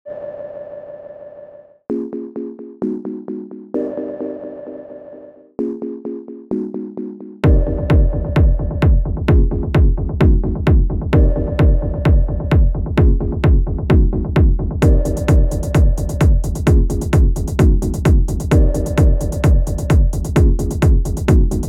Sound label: Deep Sea Techno Experiment